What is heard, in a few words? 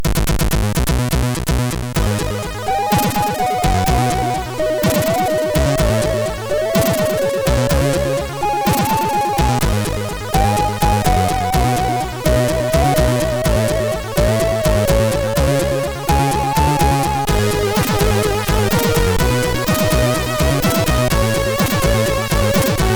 Instrument, sid MOS6581R3